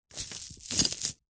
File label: household jewelry necklaces set down carpet or soft surface